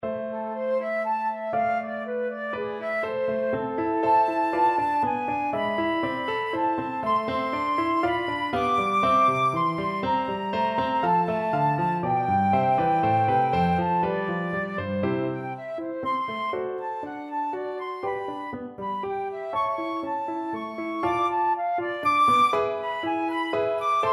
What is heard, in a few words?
flute